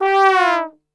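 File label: G 2 trombone; Best Service; Brass Super Section; 90 s Sample CDs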